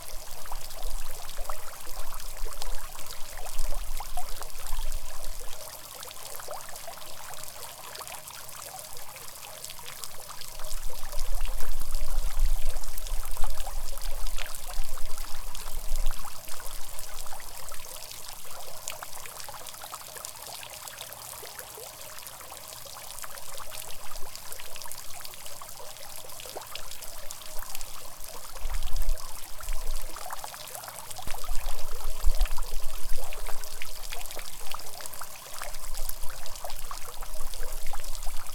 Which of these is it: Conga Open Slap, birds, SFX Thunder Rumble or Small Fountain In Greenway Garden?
Small Fountain In Greenway Garden